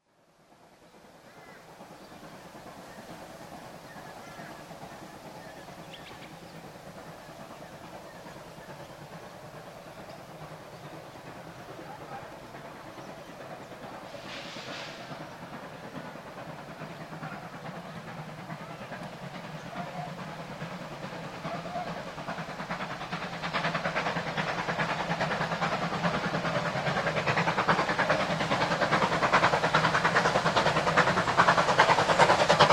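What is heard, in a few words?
Laidley, steam train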